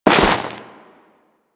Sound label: Crewman Step Ice, Footsteps; Warframe; Corpus